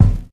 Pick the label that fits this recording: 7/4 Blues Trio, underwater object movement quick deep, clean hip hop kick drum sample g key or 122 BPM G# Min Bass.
clean hip hop kick drum sample g key